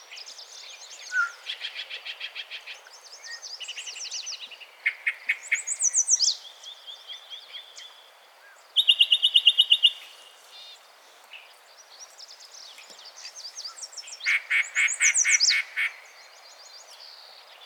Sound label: Yellow breasted Chat